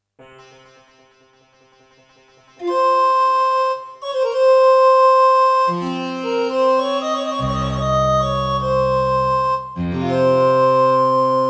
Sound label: Intro, Yamaha DTXpress; Prince of Persia 2 - Intro